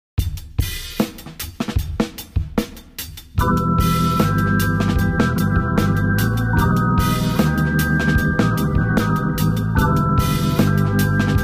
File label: Funkified